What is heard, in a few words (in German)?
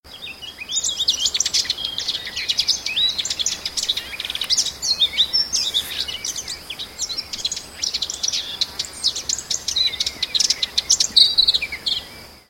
weissbartgrasmuecke